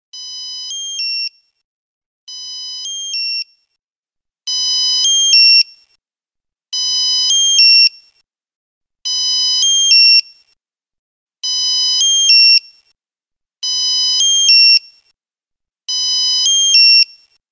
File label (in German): Alarmierungstone SMS